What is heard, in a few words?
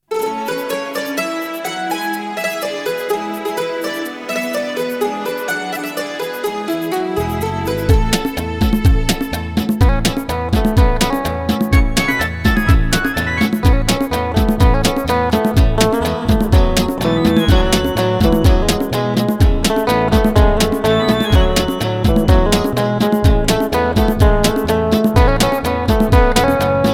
Instrumental, Saxophone Instrumentals